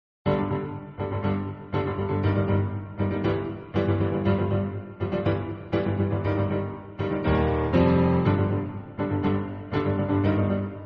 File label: piano music; Avengers